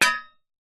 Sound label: impacts metal solid plate disc hit vibrate resonate